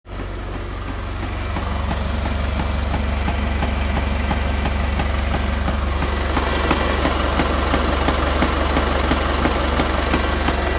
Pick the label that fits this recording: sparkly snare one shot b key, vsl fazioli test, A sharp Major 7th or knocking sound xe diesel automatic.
knocking sound xe diesel automatic